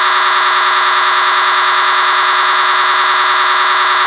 scream loop

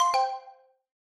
notify

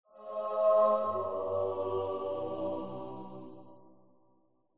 god sound

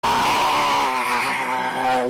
jumpscare sound; fnakazgrin 3 0 2

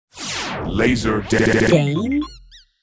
laser

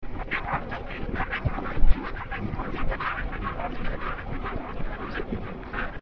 slap; slap battles

